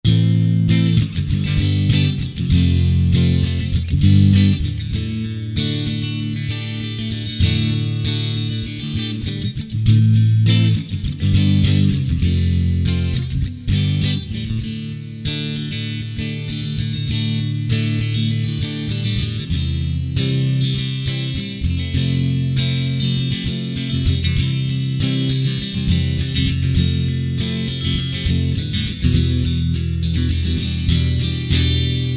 ACOUSTIC

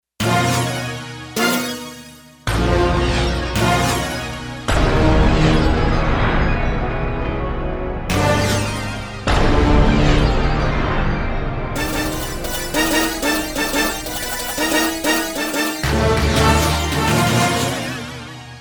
Big Hit

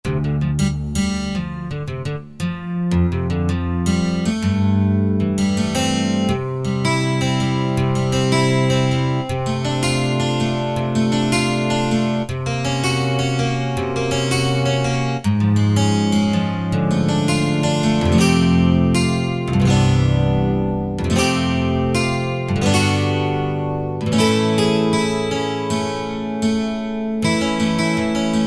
Velo Harmonics, Roland JD-990; Factory Patches

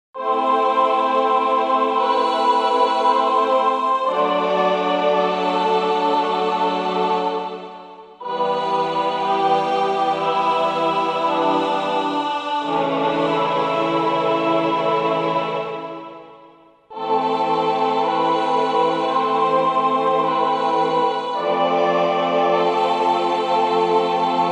Slow Choir

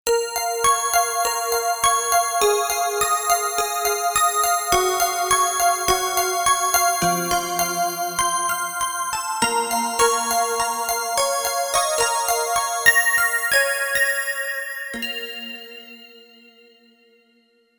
Vibrobell; Factory Patches

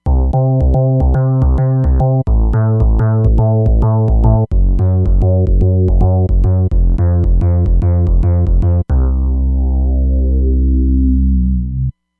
Kickin’ Bass

